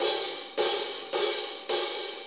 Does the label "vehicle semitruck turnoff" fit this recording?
No